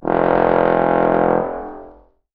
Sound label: fartsome, Horns; Notes